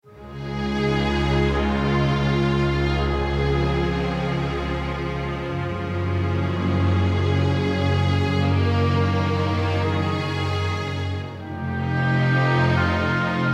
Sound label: Field BPM87 Db